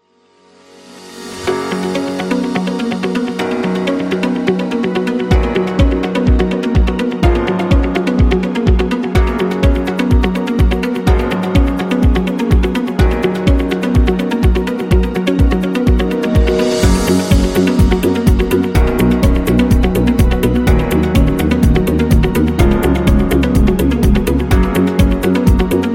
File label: Agency Promo